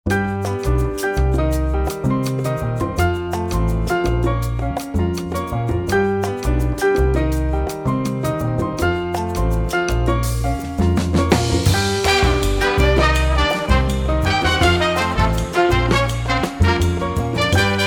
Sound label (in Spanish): Ritmo Latino